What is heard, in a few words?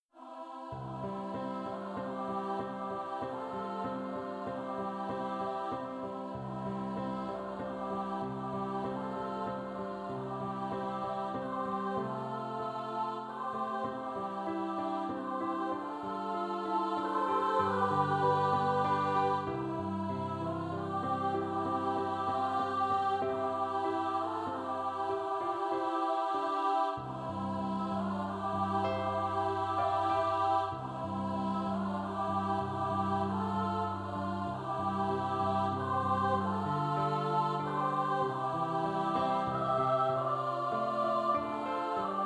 Christmas Joy duet